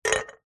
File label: cans soda 200ml 2x empty set down on concrete